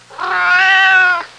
Grown cat meow

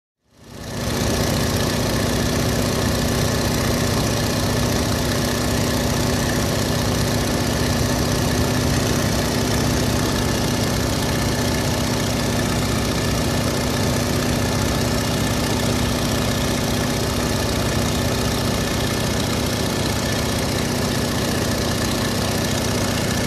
Light Aircraft Engine Noise Near

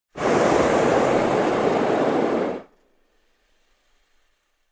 Long air pump sound

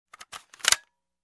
Camera Lens Shutter

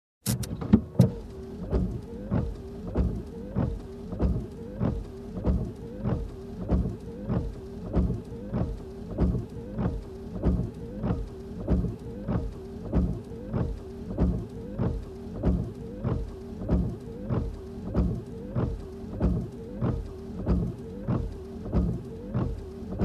Windshield Wiper fast

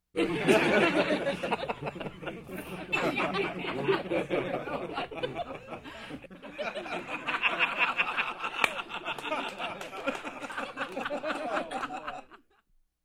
Group of people laughing up-close

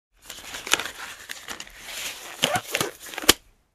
Wrapping a pile of clothes with a band

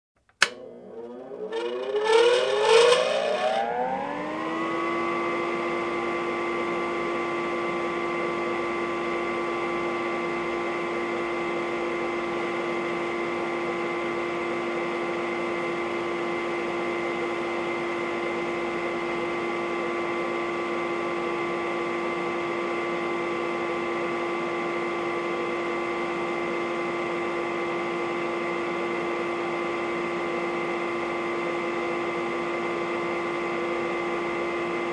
Exhaust fan turned on

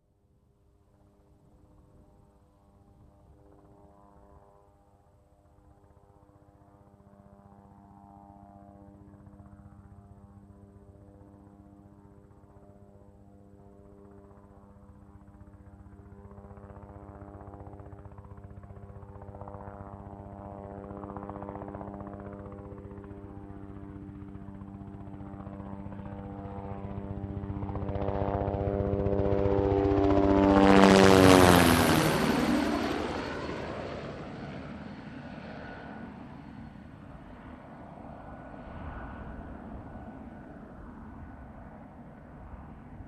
Helicopter fly over

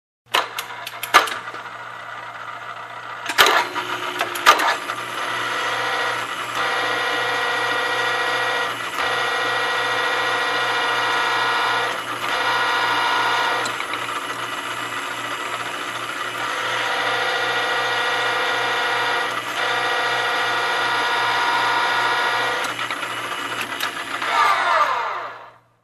Construction

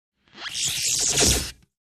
Electrical shock sound

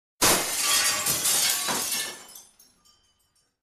Window Shattering

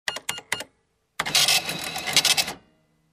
Entering item and printing receipt

